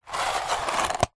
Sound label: table tennis portable retractable net retract close plastic housing